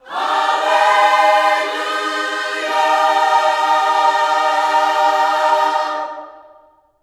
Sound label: HALLELU, Vocal Planet Gospel; HALLELUJAH